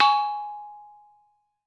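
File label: METALOPHONE, Pitched Percussion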